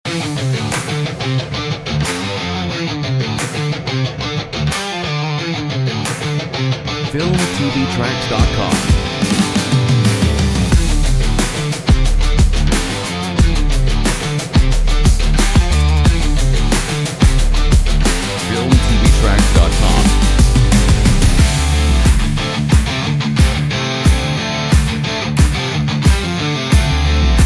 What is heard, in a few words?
SPORT EPIC TRAILER